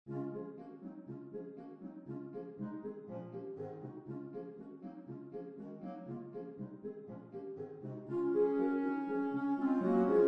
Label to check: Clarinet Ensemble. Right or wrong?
Right